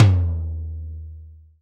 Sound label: TOM TOM, Real